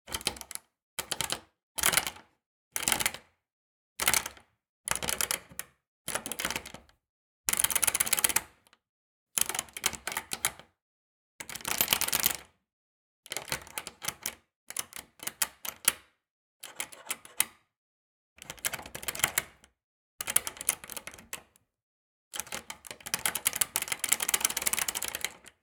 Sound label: LOCK Metal shake rattle short medium; Antisample; Shakes and Rattles